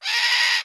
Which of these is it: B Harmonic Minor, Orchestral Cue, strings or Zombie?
Zombie